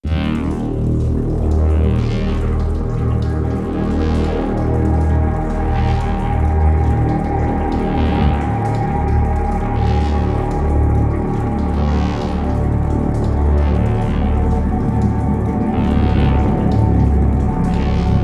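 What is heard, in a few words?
Overworld Darkcave Loop With ambience; Rad Rodgers Radical Edition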